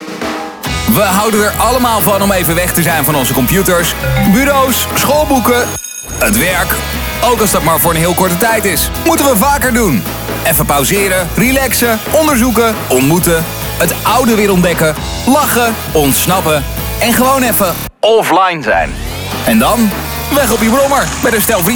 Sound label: JINGLE